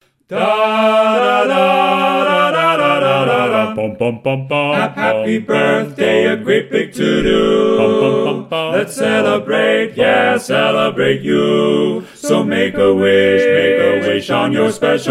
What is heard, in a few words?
unplugged